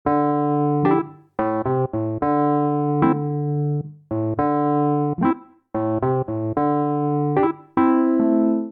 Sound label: Vamp Hammer Uptempo D Minor 110 bpm, Electric Keyboards; Ableton; Factory Packs